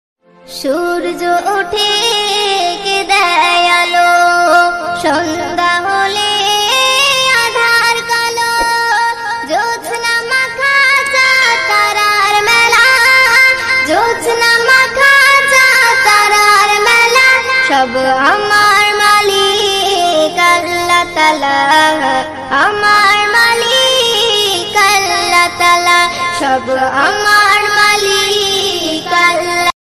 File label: bangla gojol ringtone